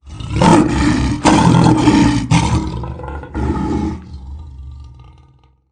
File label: Lion